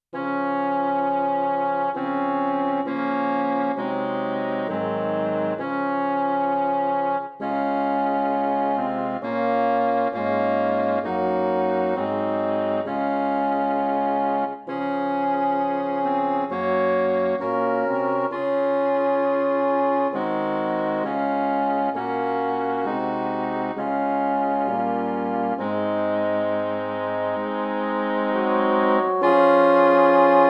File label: midi